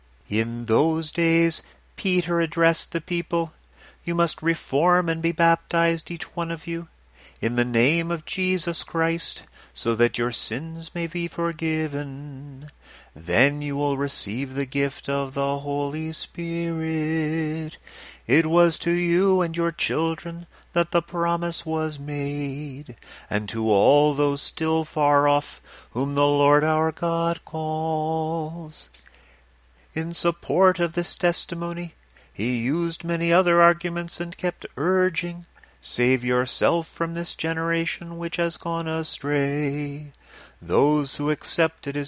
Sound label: Reading melody complete example